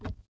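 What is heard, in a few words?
Metal run left, footsteps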